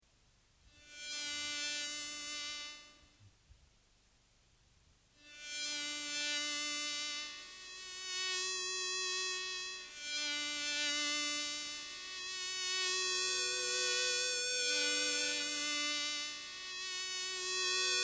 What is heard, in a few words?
Loop